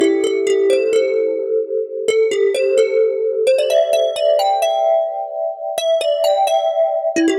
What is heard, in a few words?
A Seq Asian Harp; Zero-G; Future Wave